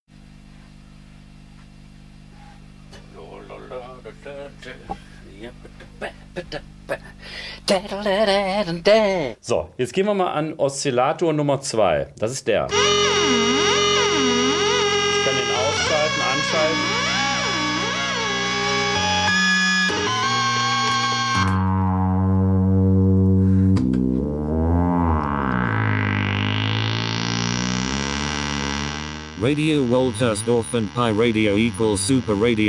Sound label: Jingle; SooperRadio; Radio Woltersdorf